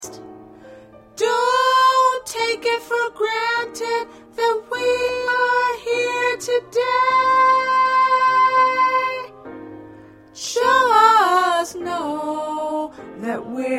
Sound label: Alto, choir